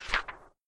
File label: book open